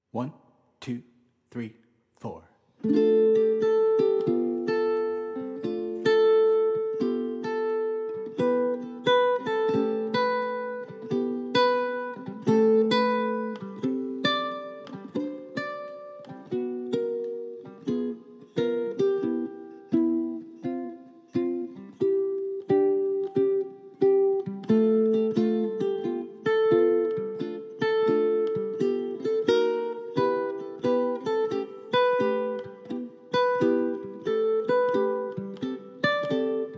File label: uke